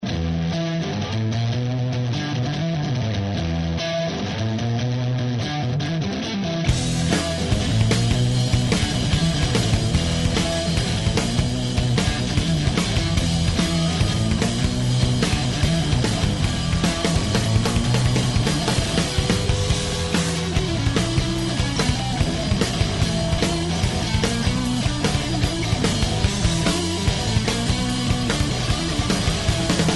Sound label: Improv Jam